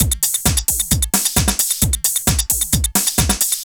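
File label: drum loops, left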